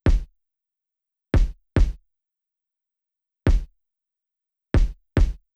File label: kick no env